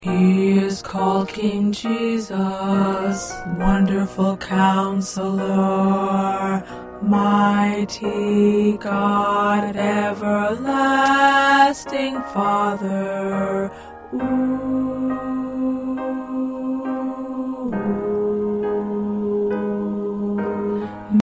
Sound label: 2nd Tenor, choir; Mary Had A Baby